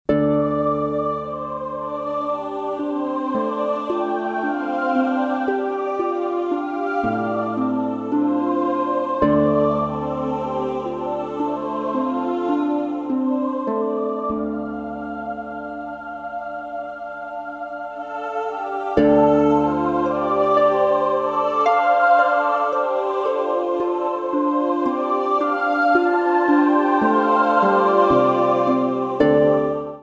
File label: with harp; choral music